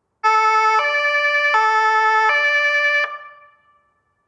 Martinshorn Einsatzwagen

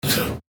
Nasenniesen

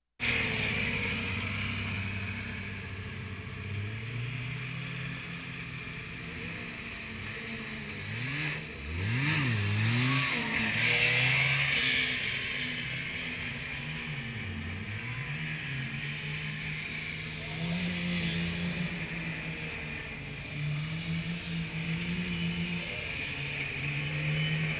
Sport Go Carts

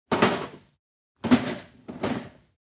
Kiste

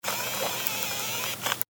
Digitalkamera Zoom raus

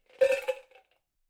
Bei Tisch Eiswuefrel Im Kuebel

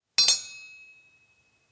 Metallstueck

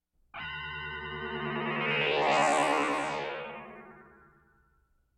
Science-Fiction Energiestrahlen Tieffrequent

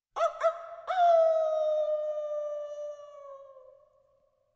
Kampfszenen Heulender Wolf Indianersignal

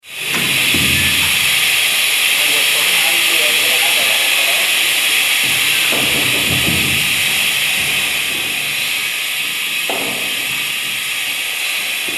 Feuerwehuebung Atmenluft

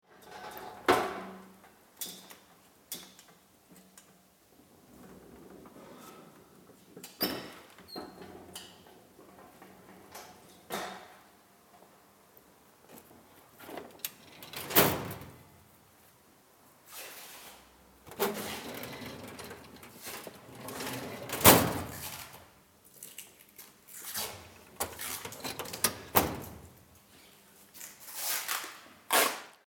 Schublade Klebeband

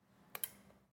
Magnetbohrmaschine

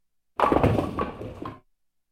Sport Kegeln Wurf

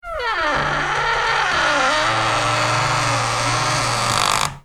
quietschen

